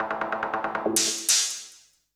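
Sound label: SNARE ELECTRO 140, Techno Drum; Techno2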